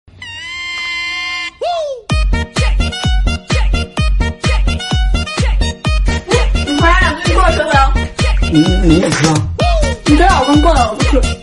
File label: laugh; breadradio